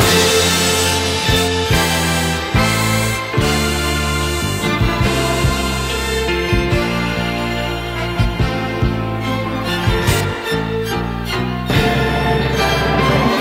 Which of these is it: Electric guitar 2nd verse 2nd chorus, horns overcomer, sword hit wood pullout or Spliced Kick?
horns overcomer